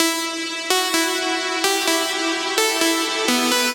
Synth loop Em 9a 128, tribal house

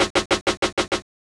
perc triplets, tribal house

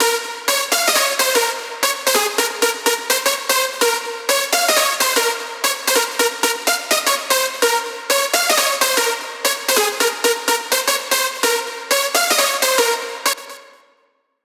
126 Drop Lead

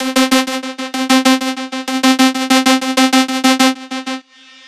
128 LEAD LOOP

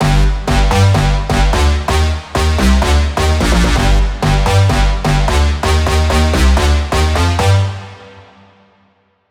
6A 128 Brass Lead WET, tribal house

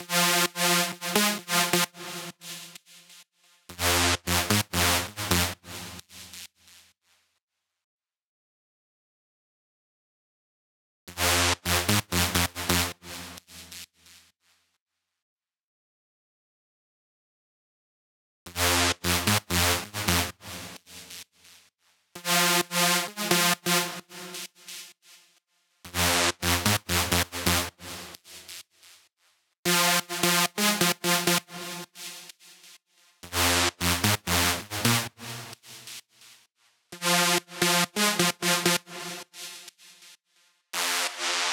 LEAD LOOP FM 130, tribal house

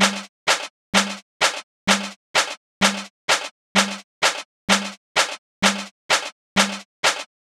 6a 128 perc cha cha tribal

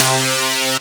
LEAD CM 5A 128, tribal house

